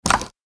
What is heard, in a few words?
tile scatter; tekton